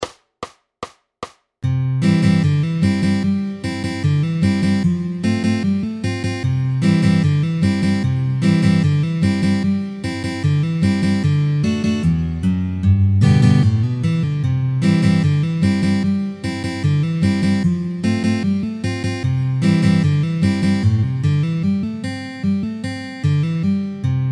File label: Country Doodle C Dur